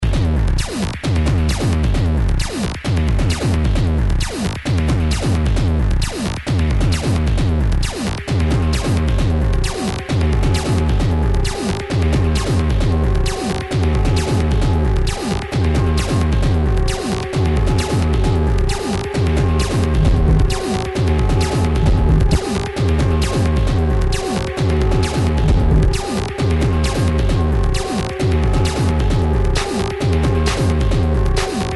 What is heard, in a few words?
Analogue Bubble Bath A2